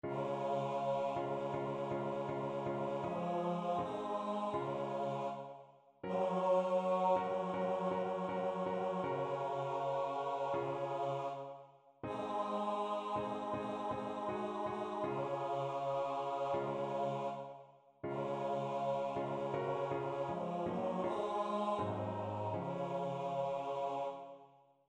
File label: Bass; God Be with You